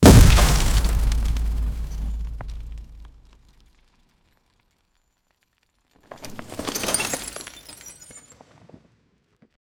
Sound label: clean cinematic car accident